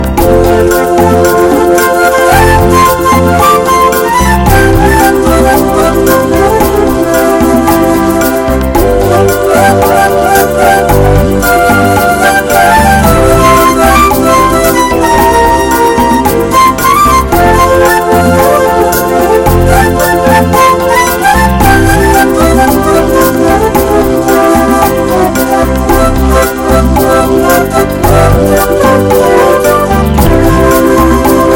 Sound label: Super Latin Sounds